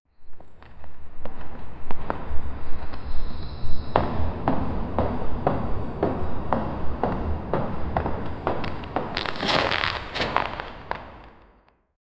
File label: 9 steps of an approaching, mammal